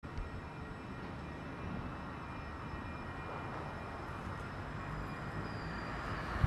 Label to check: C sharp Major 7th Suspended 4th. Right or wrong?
Wrong